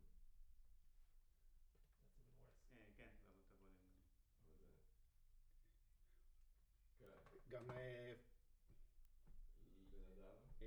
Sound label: Room